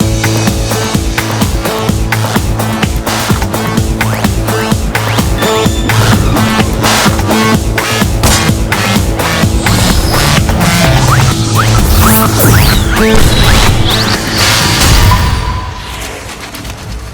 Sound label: waltz; drv3; the flea waltz; punishment